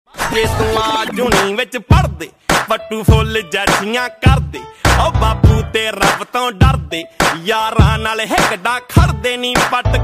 Punjabi Beats Ringtone

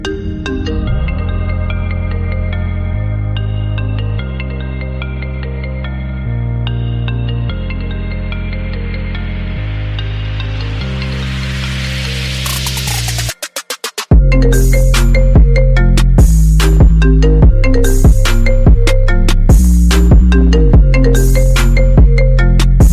Iphone 8 Plus Ringtone; Lost In Space

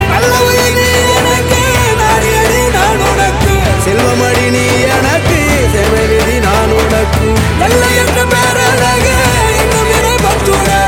Male, Tamil; Tamil Movie

